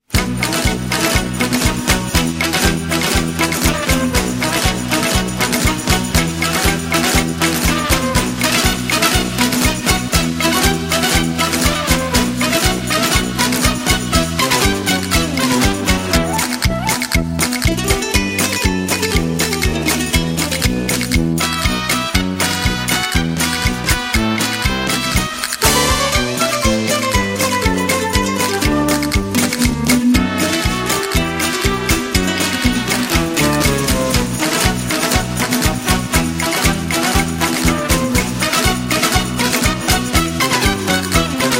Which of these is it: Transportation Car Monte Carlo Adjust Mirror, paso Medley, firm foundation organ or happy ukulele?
paso Medley